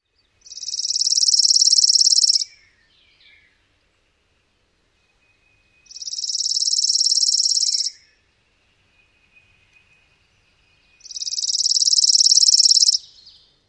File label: songs